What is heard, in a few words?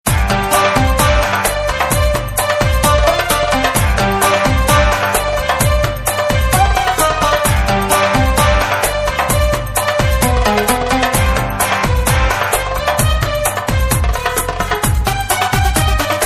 Arabic Night, Ring tones; LG KP500N Cookie MEA